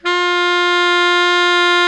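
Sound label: baritone mezzo forte F 3, Sax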